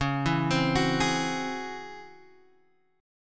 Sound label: Db Minor Major 11th Db Guitar Open G